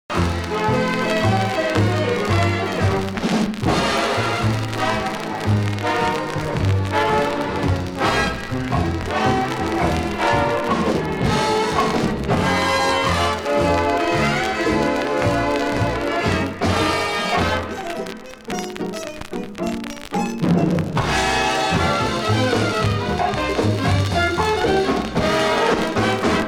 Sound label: instrumental acetate scratchy record clean up